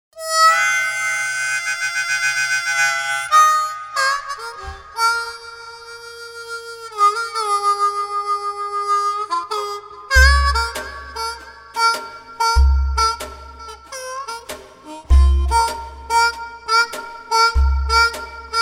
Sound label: Blues